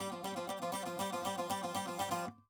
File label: saz